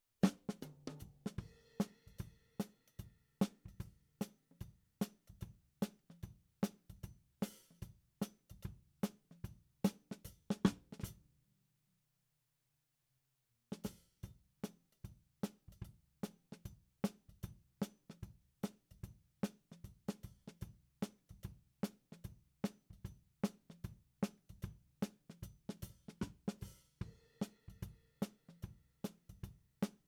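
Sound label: Snare top, Ragtime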